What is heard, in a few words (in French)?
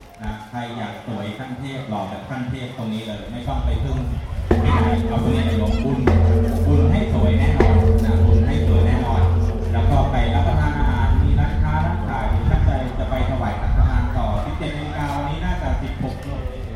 Thaïlande Bangkok cérémonie de la songkran au wat pho